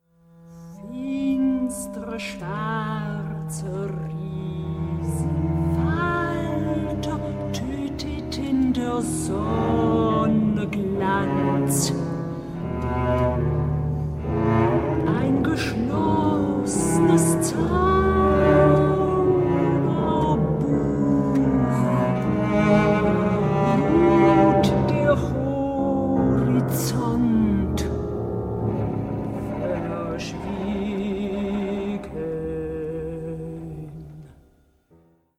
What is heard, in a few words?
sprechgesang